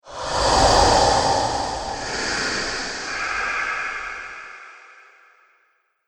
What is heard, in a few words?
Pyramid Phantom, ghost sound effects